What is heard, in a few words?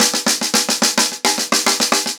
110BPM, SNARE TOM SOLOS; Sonokinetic - The Roots Drumloops; ACOUSTIC DRUMS